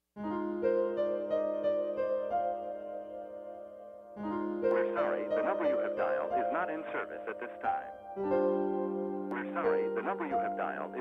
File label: bgm piano